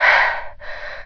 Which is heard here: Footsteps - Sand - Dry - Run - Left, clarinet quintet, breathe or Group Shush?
breathe